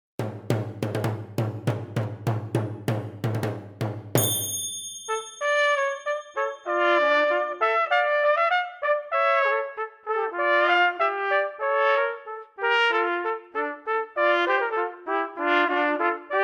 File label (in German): Bourree Duett